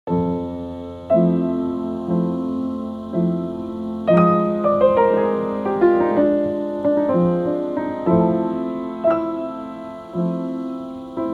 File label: Just Piano Version